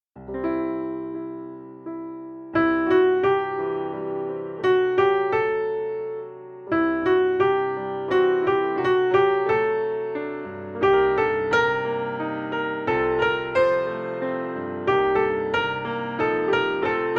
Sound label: Melody; The Little Mermaid - A Musical Adventure; Ariel